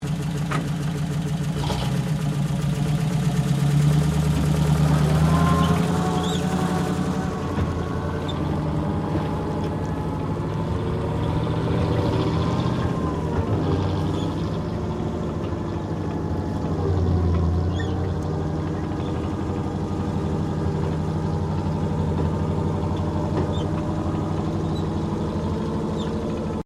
Tank Bren Gun Carrier Start, British Universal Carrier; Trucks; Vehicles